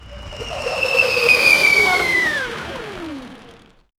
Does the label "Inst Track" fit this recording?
No